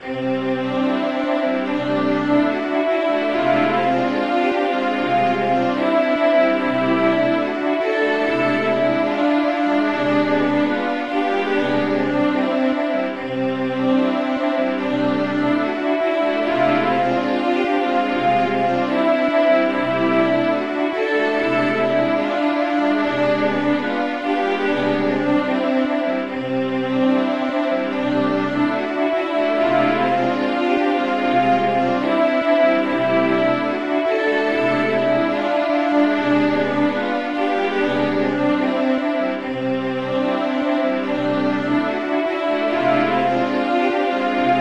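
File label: Bad Evaluation Theme Orchestral, MIDI; Sim City SNES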